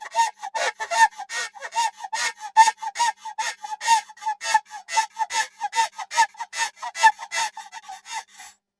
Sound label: Pelican Sqwaking